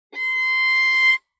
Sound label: Violin arco ff sul D C6; MIS Pitches - 2014; Strings